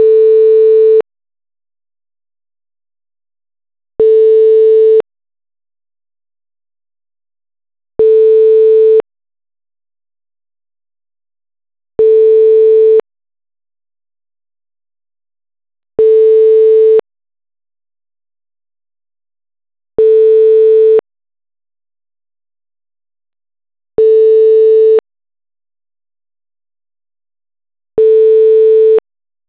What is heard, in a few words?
waiting 440